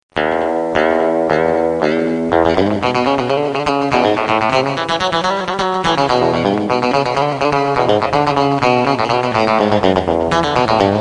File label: rockabilly, guitars